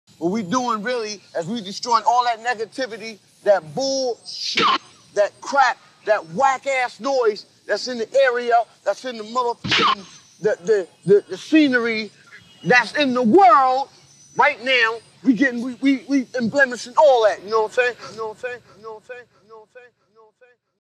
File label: Skit (Instrumental)